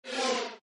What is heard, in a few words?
rollover; sunflare; avengers